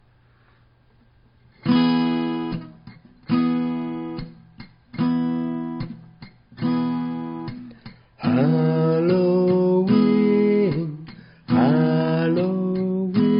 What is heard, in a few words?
a halloween song; mission langues 59